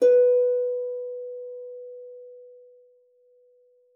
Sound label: HARP A#4; MF; Xsample VOL-8